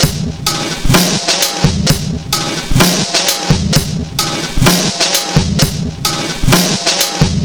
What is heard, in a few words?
Drum Break, Trip-Hop Loops; Sonic Foundry; Rondo Brothers Trip-Hop Loops & Samples; Hooked On Hookers